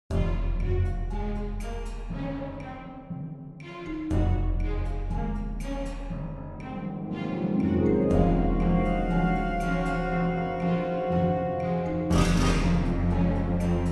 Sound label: Action Low Intensity; Kuat